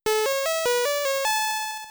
Round Clear Music

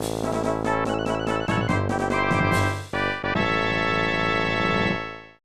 Level Clear; The Magical Quest Starring Mickey Mouse; SNES

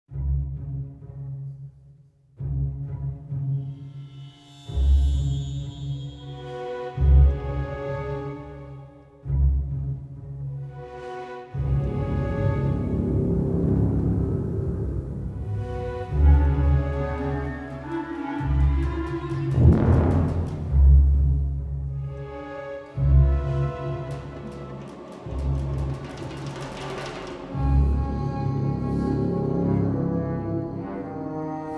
World Music

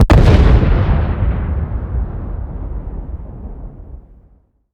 IED Explosion Distant

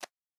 Catch; Boomerang Fu

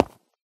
footstep concrete land; SFX; Environment; MONO; Footsteps; ProSoundCollection v1 3

